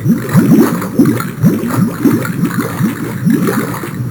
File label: sludge run; duke